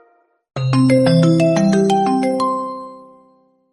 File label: wechat ringtone